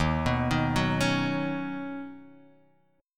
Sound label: D sharp Minor 7th Sharp 5th D sharp, down; D#m7#5 arpeggiated